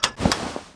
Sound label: mg up, weapons; svencoop; ww2projekt